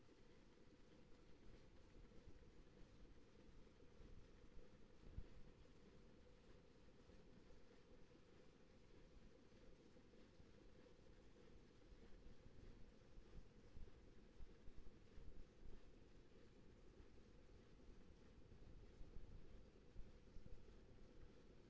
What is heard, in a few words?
HYDROPHONE 16000Hz; JASCO AMAR HYDROPHONE 740